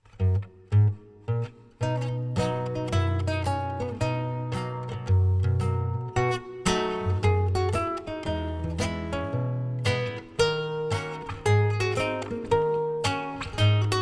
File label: raindrops, tamtam solo